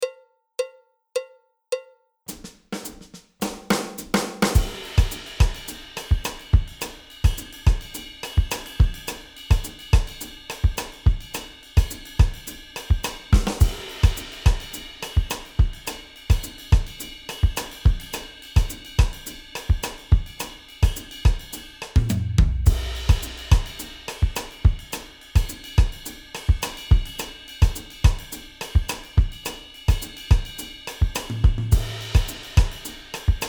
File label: clave cascara, drums